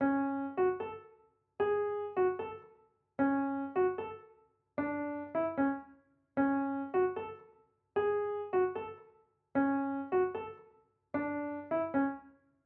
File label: Melody Idea in 7/8 and 132bpm